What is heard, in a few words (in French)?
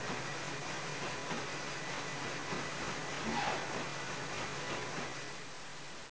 Machines Son